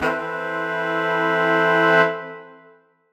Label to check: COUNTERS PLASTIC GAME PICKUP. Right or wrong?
Wrong